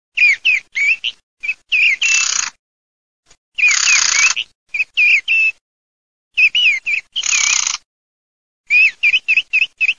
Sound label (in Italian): TORDELA